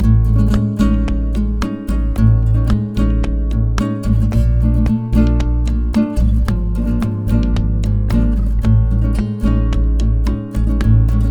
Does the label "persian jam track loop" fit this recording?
Yes